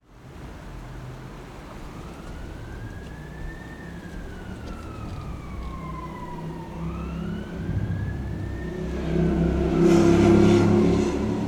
ambience town outskirts residential windy leaves autumn traffic police siren people